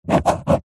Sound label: office pen ballpoint paper hollow table draw triangle; zapsplat